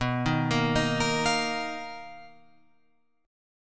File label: B Major 7th Flat 5th B down Guitar Open F; arpeggiated